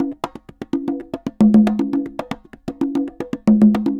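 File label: CONGAS SALSA, salsa drums and percussion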